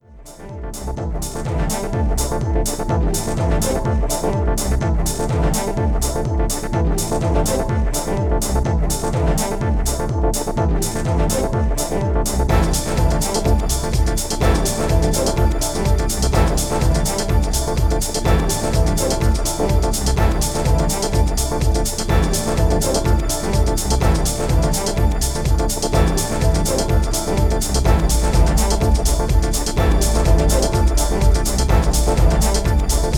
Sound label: Strings Of Today